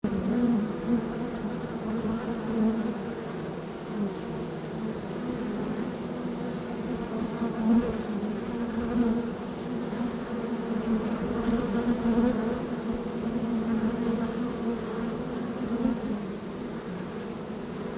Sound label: bee; Nursery